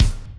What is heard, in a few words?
kick; virtual drum machine